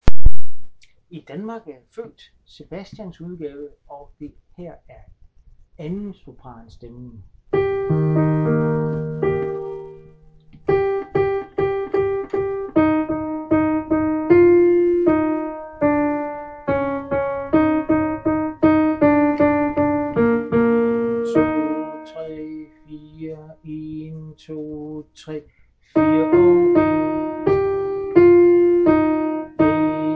2. sopran

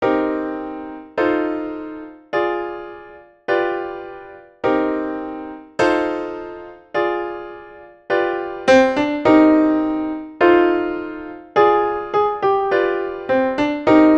sopran; Advent

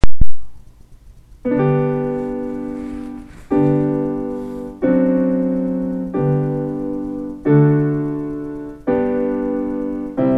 akkompagnement

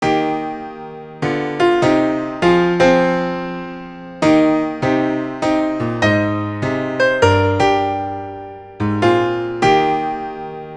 ALLE STEMMER; Deep river

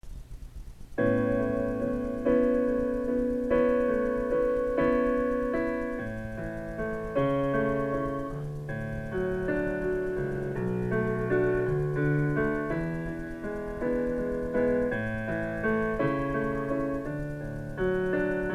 akkompagnement

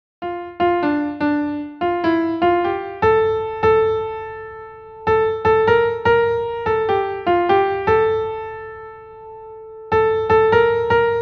sopran

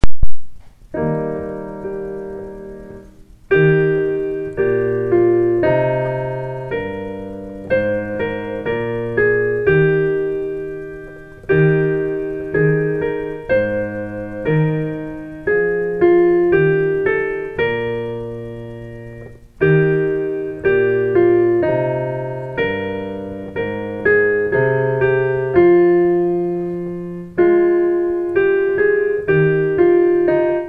sopran; abide with me

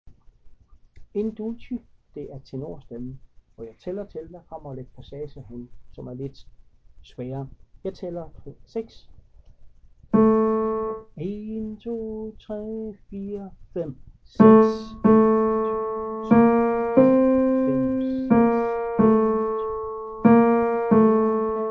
tenor

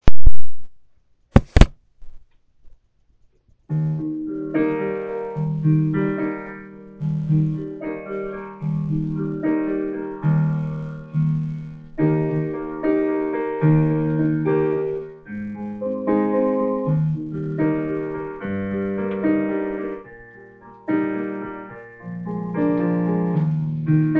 akkompagnement

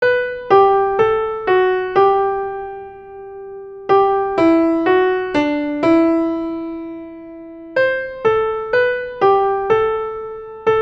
sopran; Dagens sidste gloed

